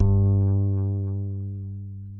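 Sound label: acoustic bass vibrato G2 left; Masterbits CLIMAX 7 - Bass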